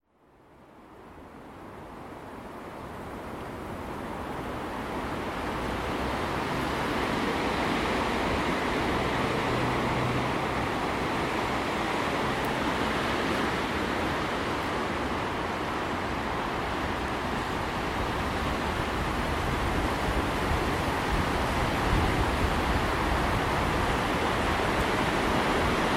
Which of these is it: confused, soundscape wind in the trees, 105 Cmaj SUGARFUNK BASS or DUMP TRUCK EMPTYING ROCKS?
soundscape wind in the trees